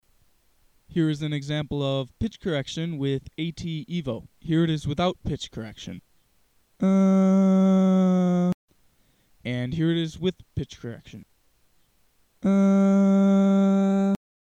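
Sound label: Pitch Correction Auto-Tune Evo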